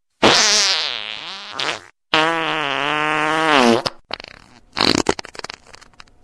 farting mix

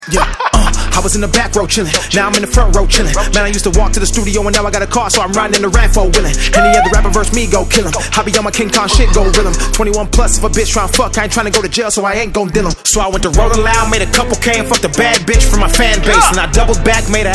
rap freestyle

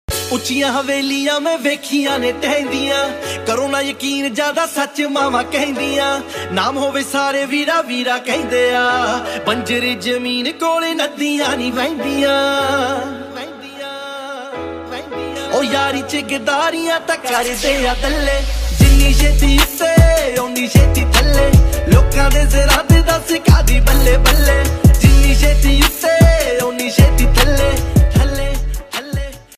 Punjabi

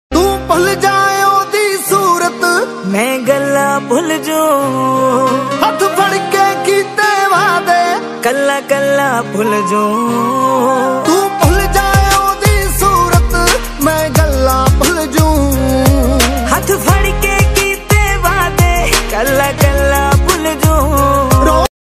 sad ringtone

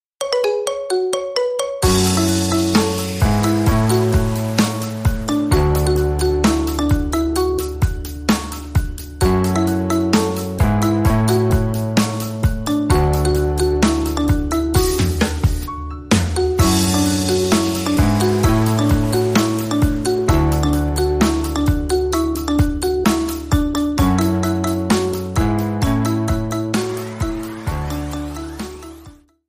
Marimba Remix

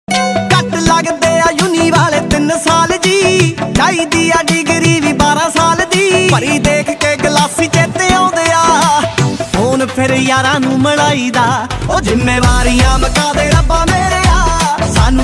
punjabi song

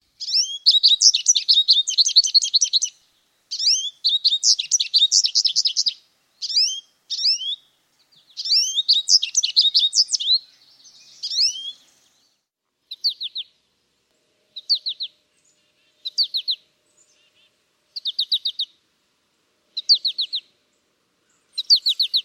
american goldfinch bird song; iphone ringtone

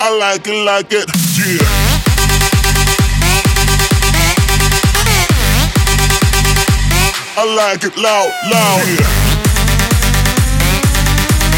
i like it loud edm

